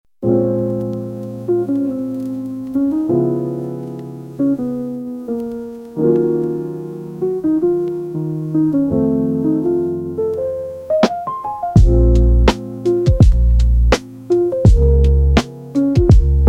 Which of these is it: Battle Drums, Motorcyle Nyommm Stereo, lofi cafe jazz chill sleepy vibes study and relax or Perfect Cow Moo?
lofi cafe jazz chill sleepy vibes study and relax